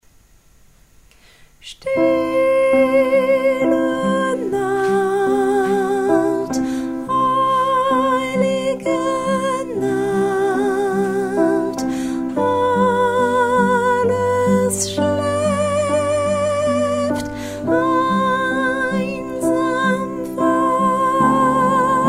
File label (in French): douce nuit tenor couplet allemand